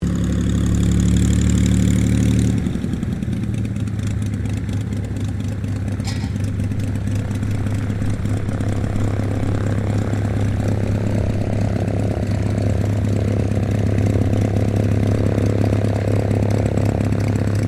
AIRCRAFT PL-12 AIRTRUCK IDLE SHUTDOWN; 1965; Airplanes

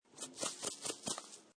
Salt Shaker Shake Salt Sprinkle Salt; Lids

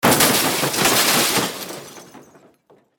Crash Metal Glass, Crash, Metal, Object; Crashes; Objects

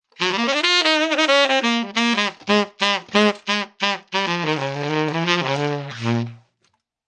Cool Sax Riff, A cool saxophone riff; Horns; Musical

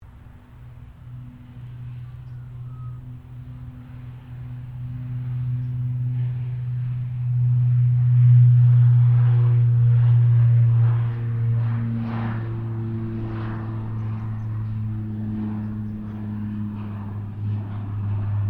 2007 KING AIR 350 TAKEOFF DISTANT; Airplanes; Vehicles

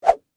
Whip Whoosh Medium, Dowel, Rod; Foley; Whip Sound Effects; Whips (Sound Effects:Objects)

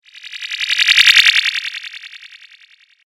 Hover, Robot, Pass, By, Scifi; Sound Effects:Science Fiction; BlastWave

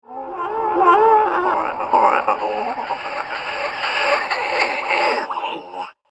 Undead Gremlin Spirits; Ghost Sound Effects; horror; Andrew Fleming

